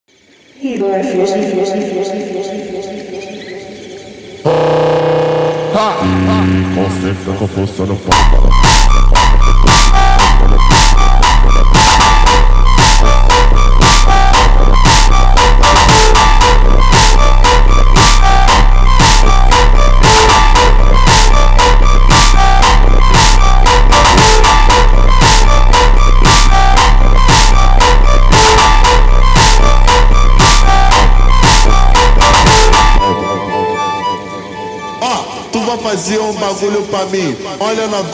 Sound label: slowed, funk